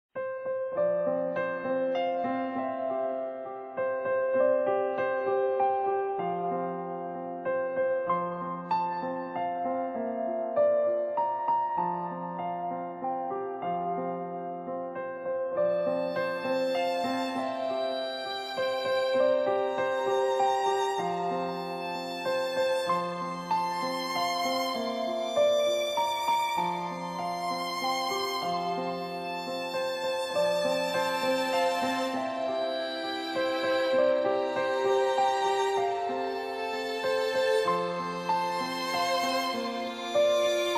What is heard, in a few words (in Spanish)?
Cumpleaños feliz con Piano y Violin